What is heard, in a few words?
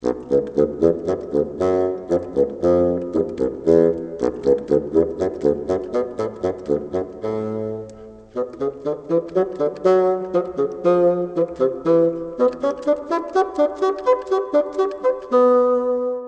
Bassoon